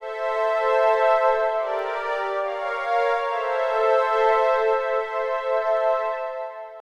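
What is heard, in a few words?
synth electro 140 A; Techno; Keys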